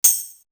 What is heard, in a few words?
Tambourine; AMG; Perc One Shots